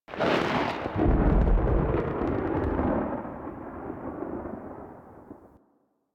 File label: Landscape Thunder Crack, Ambience; Warframe; Venus Open World; One Offs